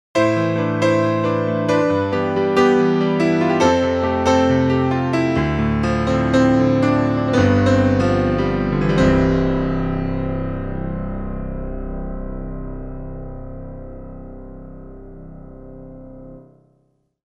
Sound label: Piano Rhodes